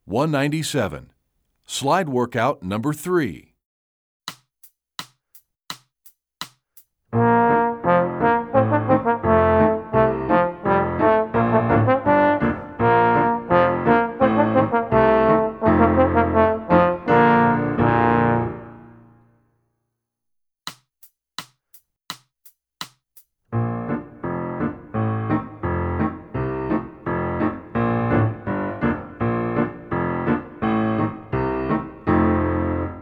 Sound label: Slide Work-Out, trombone; essential elements